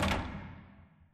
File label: radar tower start clunk; Titanfall 2